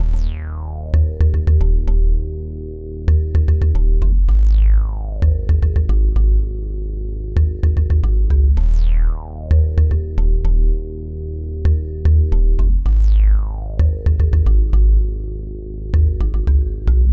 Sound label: Log Drum and Synth Bass